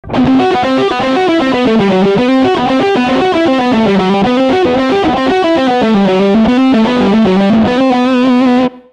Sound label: Texas Riff